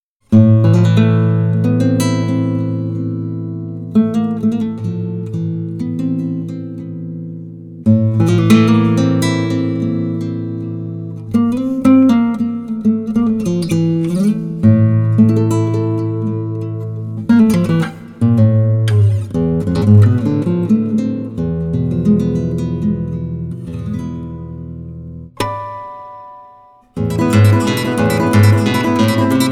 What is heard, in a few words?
Handshake, Ethnic